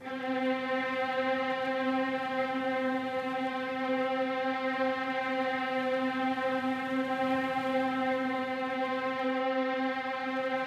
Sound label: Classic Melody Loop, 90 BPM C# Min Strings; Melodics; Loops; Loop Stems; Cymatics; Eternity